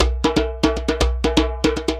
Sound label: DRM